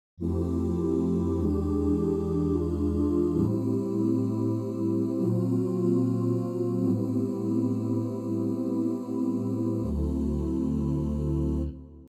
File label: choir Oohs